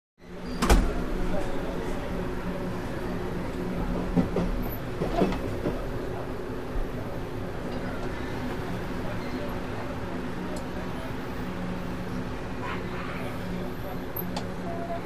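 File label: Interior Commuter Train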